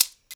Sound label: MARACA, Percussion; InVision Interactive; Percussion Slam; BOLERO